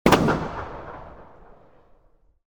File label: explosion